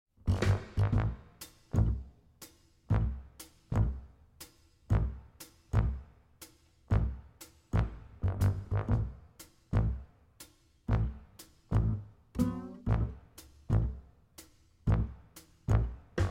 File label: Bluesin'